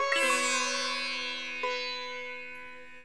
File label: sitar